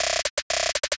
Snare Roll, 120 BPM; Drum Accents; Abacus

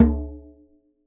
Djembe; Cymatics; Emperor World Percussion (Teaser)

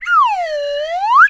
whistle with sliding tone, Cartoon; Cartoon - film effects

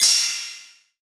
Crash, Hip Hop Drums - One Shots Cymbals; Cymatics - 9God Hip Hop Sample Pack

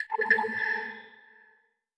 Wet Percussion; Teleport

